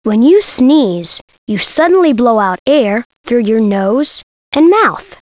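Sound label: sneeze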